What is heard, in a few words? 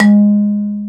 Marimba G#2; Digital Sound Factory Virtuoso